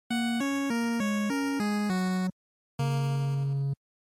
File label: NES Racing Game Over